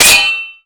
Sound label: block